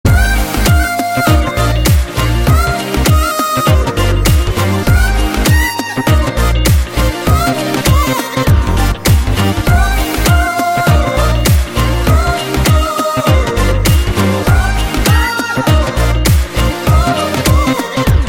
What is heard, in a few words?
Love BGM